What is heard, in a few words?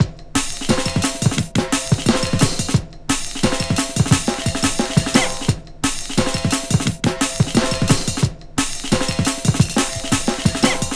Rugged Break, Drum & Bass, Beats & Breaks